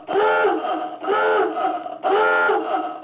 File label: submarine dive horn